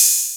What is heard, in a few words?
Roland R-8 Hat, Drums